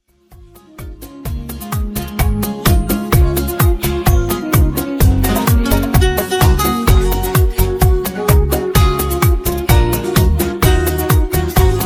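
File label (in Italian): Bachata Ballo Di Gruppo Versione Donna Canto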